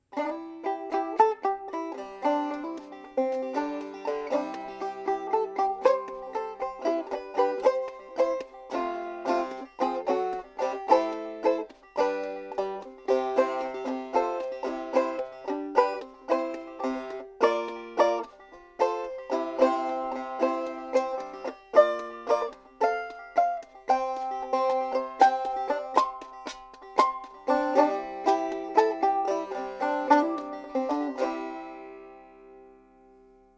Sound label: E frail; neck wood